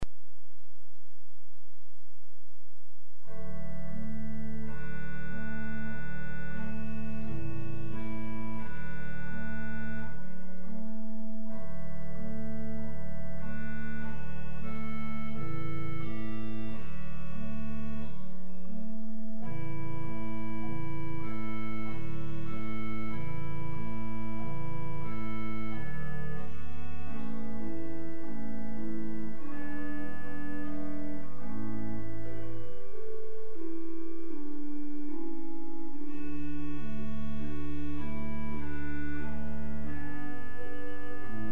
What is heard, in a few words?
excerpt from clarinet concerto in a mozart